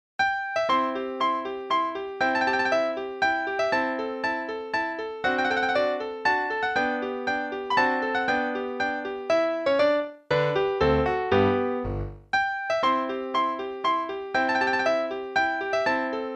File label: Play-along Minuet in C (qn=120), piano; Haydn